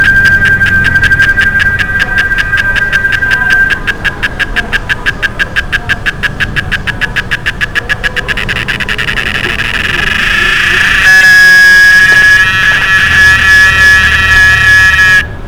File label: Quesada gigas